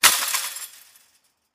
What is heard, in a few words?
Chain Link Fence Gate; fences